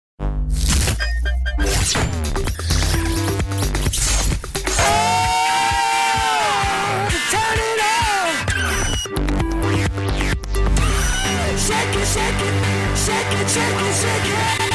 Nokia-xpress Rock

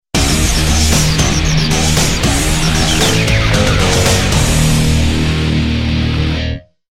Stage Clear Jingle

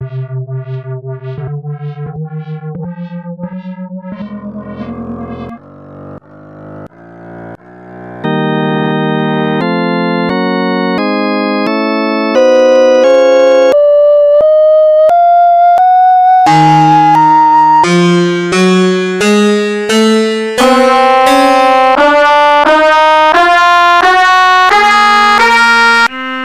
B Sharp Minor scale

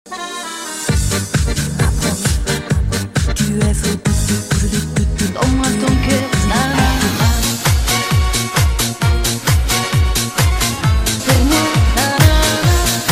Tango

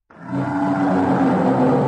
Raaaaaaaawr

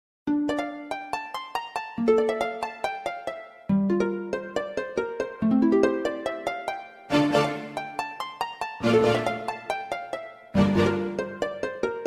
New Pluck Music

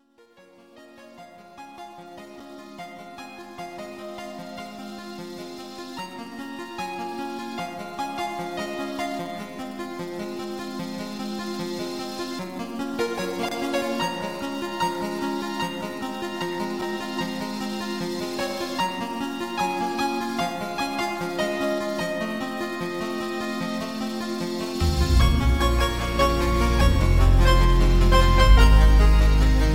8BIT

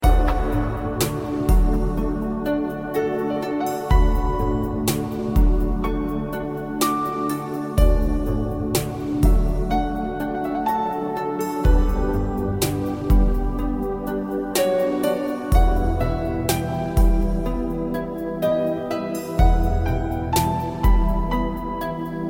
Meditation

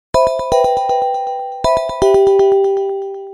Best Htc Msg Tone

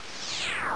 Teleport In; MMX

